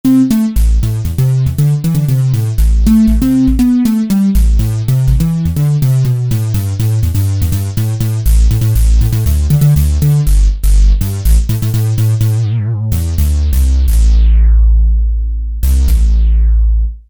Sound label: Megarz Bass